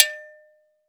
afro ago, afric ago go

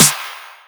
Snare Clap; Zero-G Club Techno; Percussion

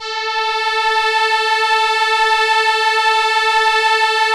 ARP STR ENSL A3, First Call Vintage Keyboards

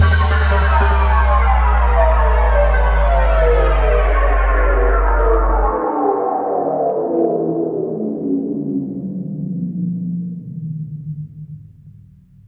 Rhythms 151 Ebmin, Hardcore Breakbeat; Big Fish Audio; Rhythms 4 Life

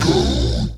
vox special

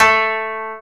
KOTO A2, Asian Traditions; USB Soundscan vol.60 - Asian Traditions; AKAI

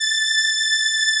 SOLINA, String Machines

